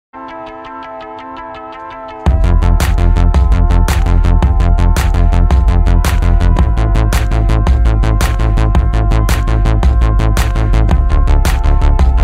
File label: Error Song